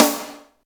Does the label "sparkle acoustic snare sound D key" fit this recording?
Yes